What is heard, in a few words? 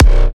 kick